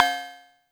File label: Cowbell FX